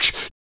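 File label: vox percussion, Vocal Planet Jazz FX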